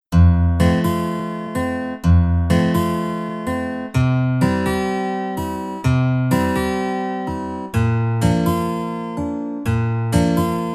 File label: hawaiian guitar, 432 Hz